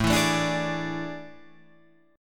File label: A Major 7th Suspended 4th, Guitar, Drop D